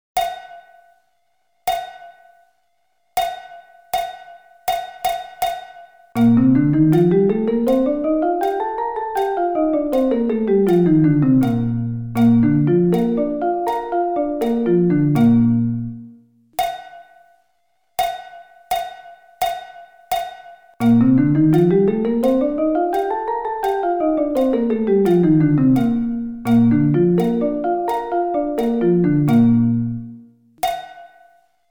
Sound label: Bb Scale